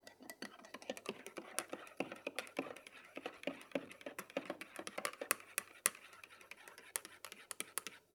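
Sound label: Coffee Stirring Sugar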